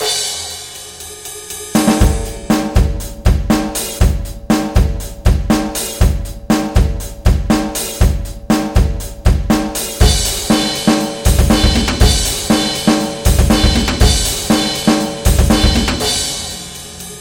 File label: drum free